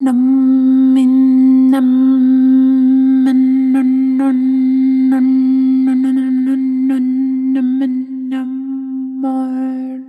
Real Arhythmic, lyrical and specialized vocal effects, a single note; vocal samples; Void & Vista; Kontakt; Real/Arythmic